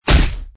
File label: attack